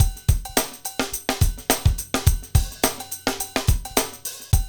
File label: Hip Hop, Drumscapes Roland; Northstar